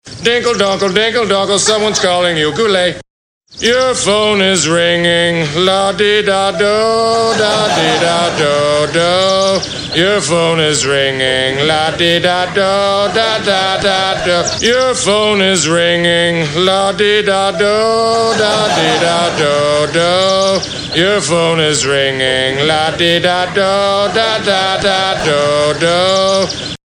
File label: Your Phone is Ringing; Robert Goulet